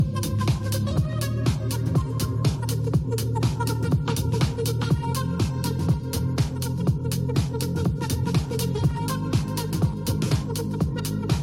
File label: Cool Synth Lead Envelope